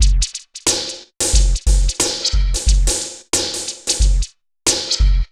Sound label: Synth Loop, BPM 90